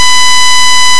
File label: Pulse Osc 1000